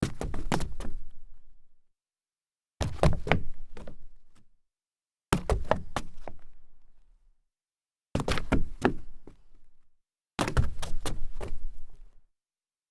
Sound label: sneaker stumble side solid wood, foley